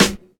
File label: Sparkle Steel Snare Drum Sound G Key, acoustic snare tuned to the G note; snare drum samples